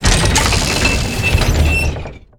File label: Enter Play